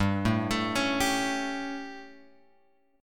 G Suspended 2nd Flat 5th G, chord; Gsus2b5 Chord {3 0 X 2 2 3} arpeggiated

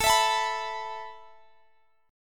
A Suspended 2nd down Guitar Open G

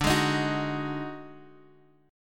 Eb Minor Major 7th Double Flat 5th

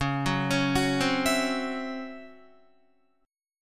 Db Minor Major 7th Double Flat 5th Db down Guitar; arpeggiated; DbmM7bb5; JGuitar

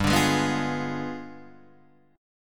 G 7th Flat 9th, Guitar, Open D

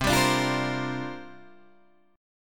C 9th Suspended 4th C down Guitar Open G

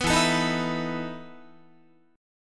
E Major 7th Suspended 4th E down Guitar; 14,12,12,13,15,15; 4A,4E,5A,5D,5G#,6C#; strummed

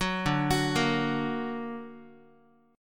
D Augmented Guitar, chord; arpeggiated; 9,10,9,8,x,x; JGuitar

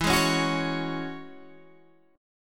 E 6th Add 9th E down Guitar Open G

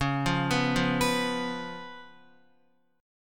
C sharp 7th C sharp, Guitar; C#7; arpeggiated; JGuitar

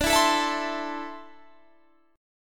D 7th Suspended 2nd down Guitar Standard; D7sus2 strummed

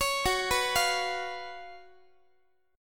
Gb Major 7th Suspended 4th Gb Guitar

